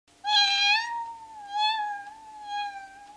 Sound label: cat